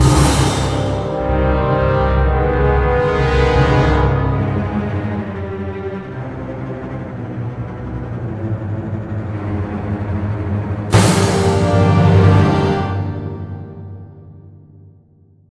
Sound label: Level Sting